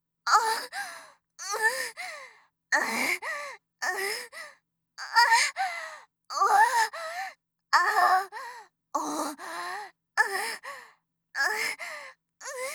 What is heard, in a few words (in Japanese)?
喘ぎ声(特大)